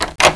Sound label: door